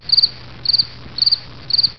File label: crickets